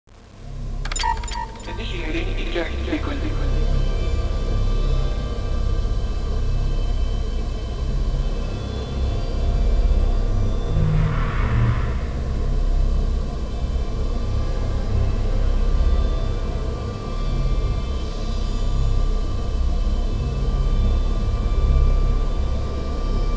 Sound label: military; methods of destruction